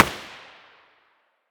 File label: muzzle g36 mono far, weapons